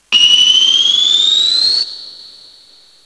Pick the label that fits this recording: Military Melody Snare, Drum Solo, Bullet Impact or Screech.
Screech